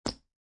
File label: Poker Chips Dropping On Playing Cards; Poker, gambling, chip: Poker chips dropping on playing cards